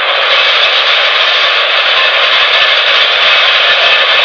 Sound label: lapping